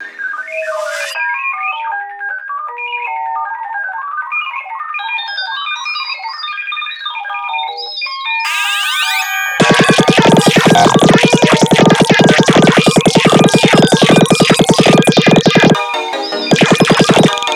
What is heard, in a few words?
Sine; lolicore; Splatterkore Reckords